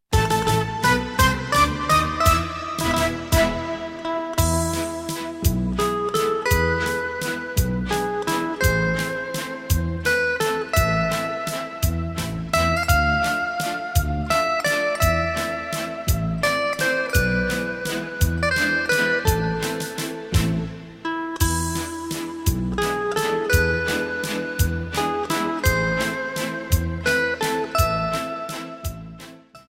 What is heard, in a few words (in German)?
orchester; wiener melodien